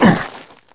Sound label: land; PC Gamer IT CD 41; Daikatana; superfly